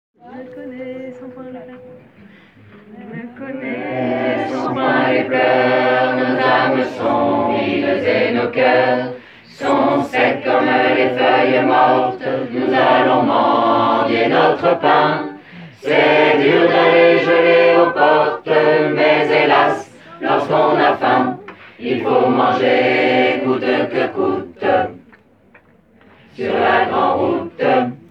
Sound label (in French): tutti couplet